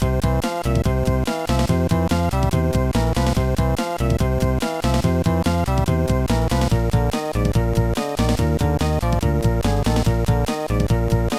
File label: Level Completed; Jimmy Neutron Boy Genius; gba; Shooter Stages